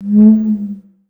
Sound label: Conga Slide; Groove Elements